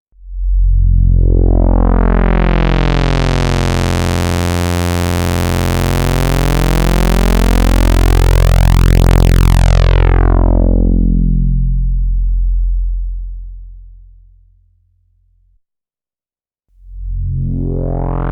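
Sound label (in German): Filter Sweeps mit Drive, synthesizer; Sequential Pro 3; Filter 1 Filter 2 Filter 3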